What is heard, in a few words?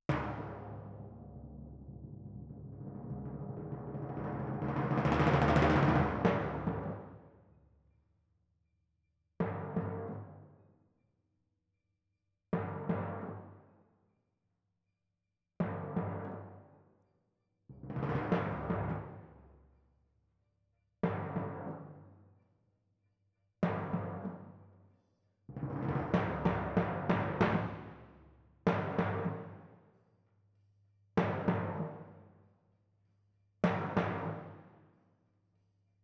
Twin F Timp